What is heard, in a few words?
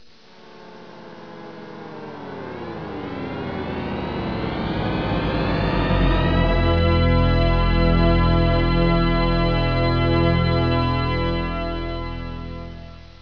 8-bit thx